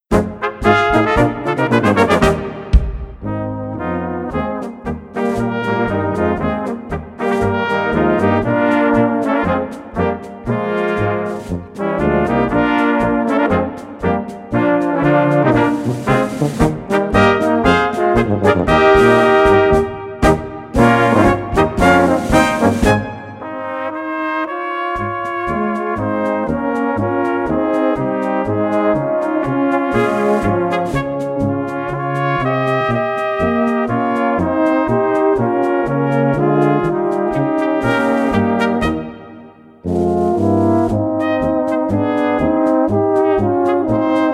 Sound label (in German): Polka